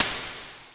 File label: BRUSH ROLL, Roland TD 7; drum machines